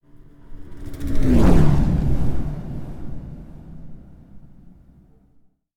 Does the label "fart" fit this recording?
No